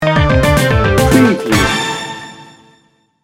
funky street sting bumper

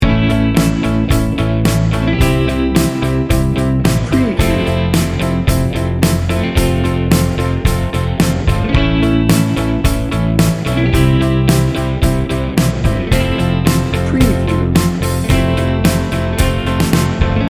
heartwarming loop

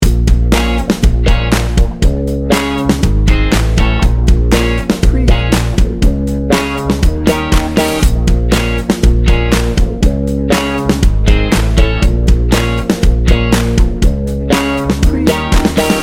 bouncy bones loop

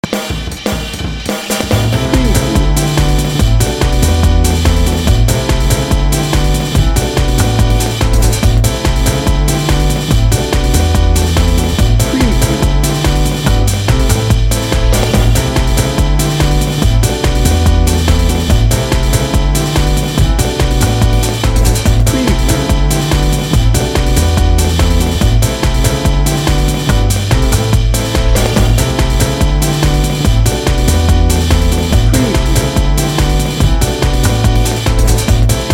city life gritty upbeat no vocals

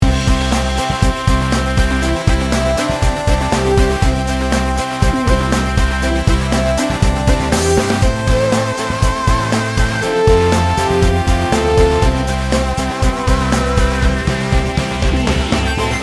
party pop rock loop

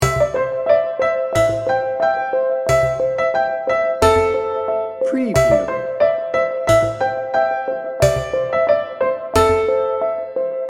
child like and upbeat loop

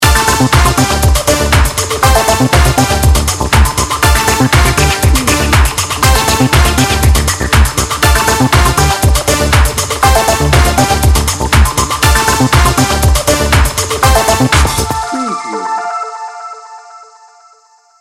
upbeat innovation

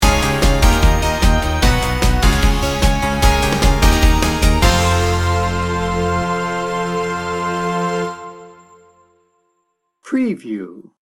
upbeat inspiration sting bumper

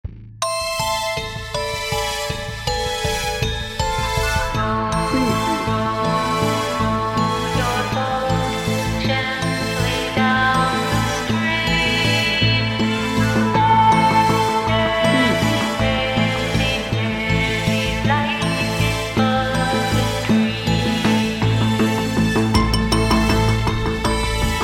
creepy orchestral hybrid; row row row your boat

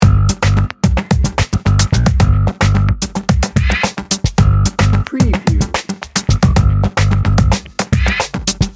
modern fashion loop

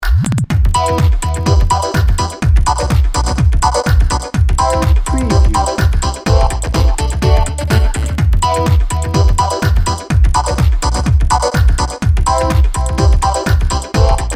style house

